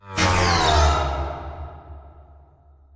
Prop Warehouse Prop Rack Stop Close; Titanfall 2; Boomtown

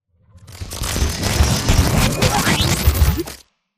Stalker Nuke Destruct Warmup; Titanfall 2

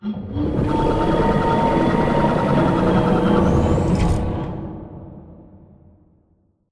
Giant Pillar Close Rotate; Titanfall 2; Boomtown

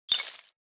imp bullet lightballistic solidglass debris; Titanfall 2

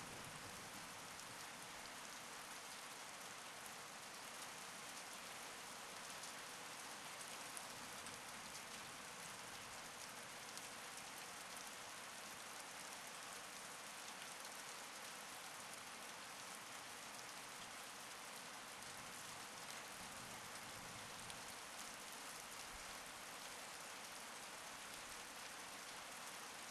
light rain layer short; colony; Titanfall 2

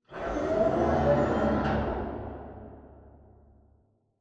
Robot Arm Pass Receive Approach Dist; Boomtown; Titanfall 2

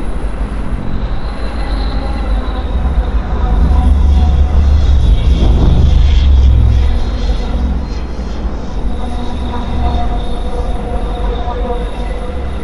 straton hover; Titanfall 2

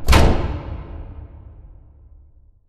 sliding door lg verb close start; Titanfall 2

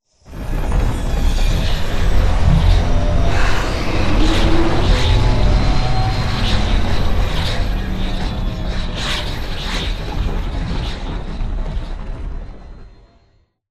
hover; colony; stratton; Titanfall 2

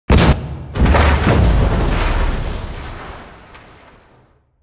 Explosion Rumble Exterior Close Punch; Boomtown; Titanfall 2

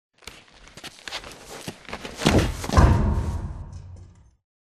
jumps in; Titanfall 2

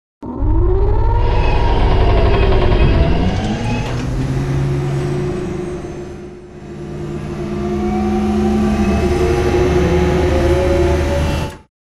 Giant Floor Panel Assemble Rotate Servo; Boomtown; Sideways Town; Titanfall 2

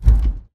bt footstep jog parts; Titanfall 2

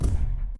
footstep jog; Titanfall 2

